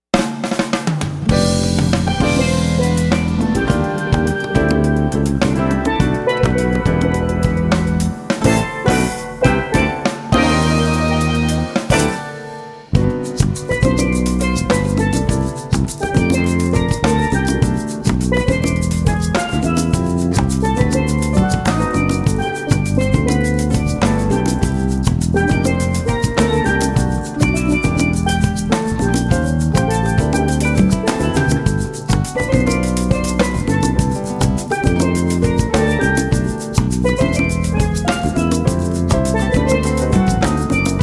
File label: Caribbean Jazz